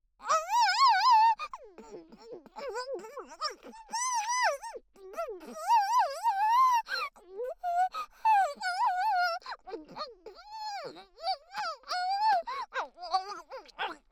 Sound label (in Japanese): イラマチオ・恐怖に泣きながら激しくフェラをする声; ストレートお姉さん系カノジョ